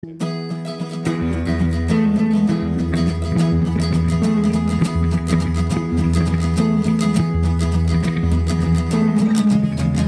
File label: acoustic